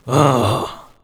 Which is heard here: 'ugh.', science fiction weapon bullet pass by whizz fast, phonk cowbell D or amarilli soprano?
'ugh.'